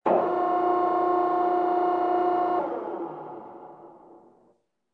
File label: ac130 hydraulics; amb elements; modern warfare 2